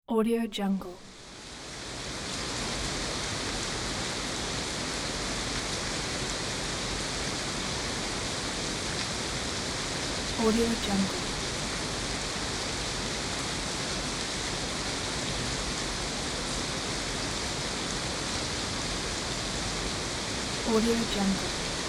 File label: strong rain